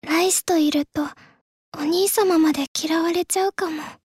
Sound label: applause